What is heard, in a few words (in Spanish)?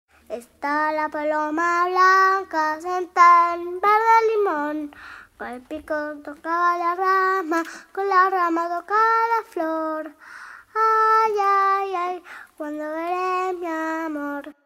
Ronda tradicional